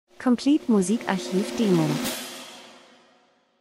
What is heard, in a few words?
drums; Trommelwirbel; Stimmung